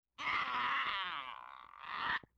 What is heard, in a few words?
LoFi Synthetic Bird Caw